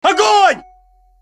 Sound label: Russian Text Tone